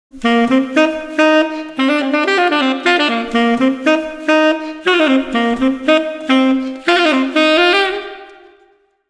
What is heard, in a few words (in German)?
Saxofon Fanfare